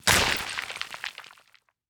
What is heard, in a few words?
fantasy material stone touch; esmteam